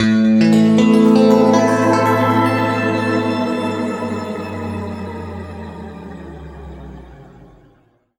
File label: A, guitar chords; East West; Steve Stevens Guitar Samples Collection